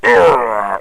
pain, zombies

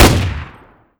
sentry shotgun; snds jack gmod

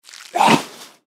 feral ghoul attack; npc

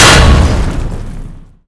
tank shell metal; wac

